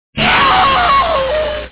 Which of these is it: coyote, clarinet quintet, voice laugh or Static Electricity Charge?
coyote